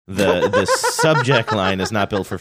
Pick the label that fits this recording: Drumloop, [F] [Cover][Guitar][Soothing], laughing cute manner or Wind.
laughing cute manner